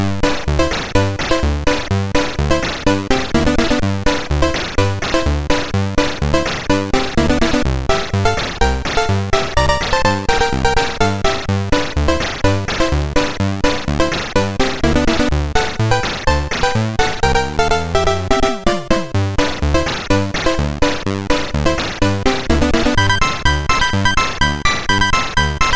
Final Synth Sample, MOS6581R3

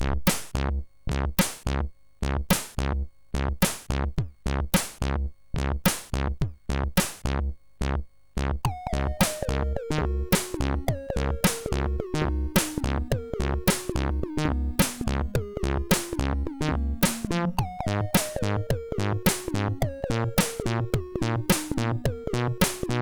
Rock n Roll, sid MOS6581R3; Rock n Roll Butterfly

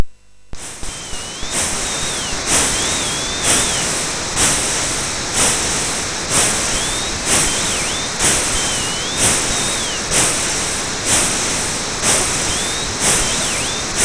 Crowd noise, MOS6581R3; hvsc

